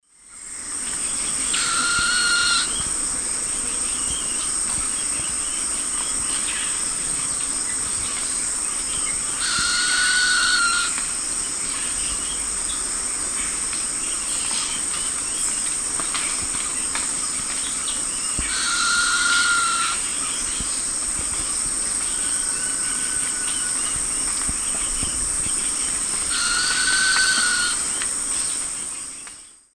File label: Piculus flavigula, calls; S. America; Eastern Lowlands